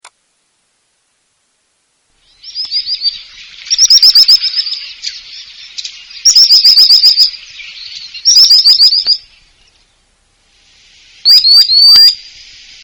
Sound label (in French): Chevalier guignette, chant